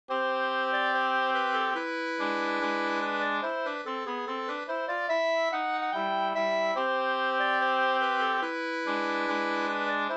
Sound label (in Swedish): brudmarsch; fr Hassela; e Bytt-Lasse